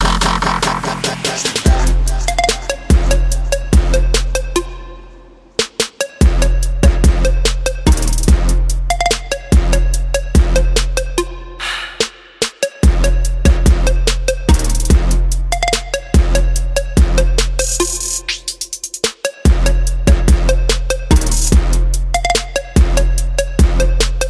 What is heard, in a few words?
trap drop